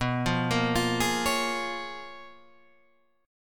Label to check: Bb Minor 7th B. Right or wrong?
Right